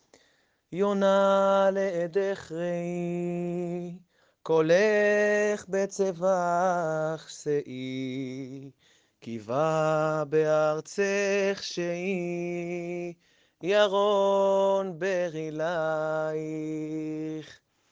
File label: Melody